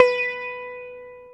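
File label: ELECTRIC SITAR B2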